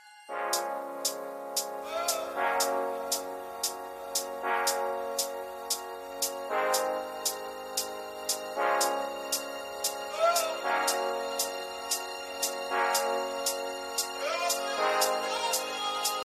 english music

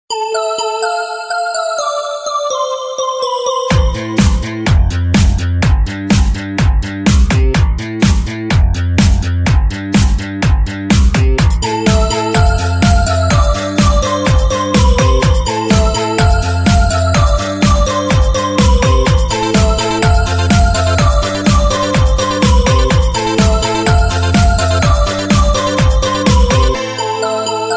iphone russian disco ringtone

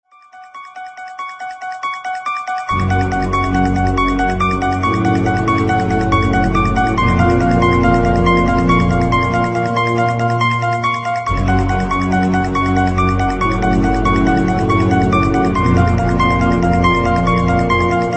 samsung halloween theme ringtone

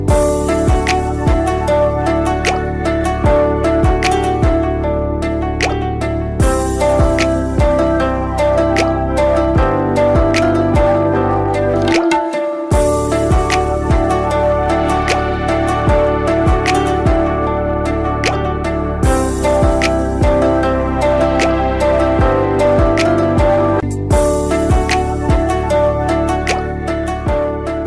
instrumental sad bollywood ringtone

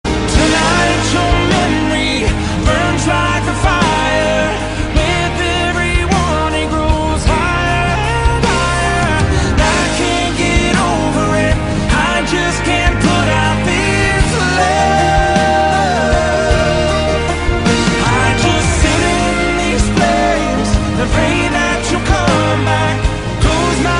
English song tone ringtone; english ringtones

